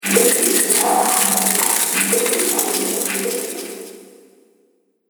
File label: Dungeon Atmosphere, scary soundscape; Fantasy; Andrew Fleming